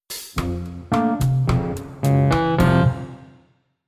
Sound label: sting bartending booted; The Sims 3; Windows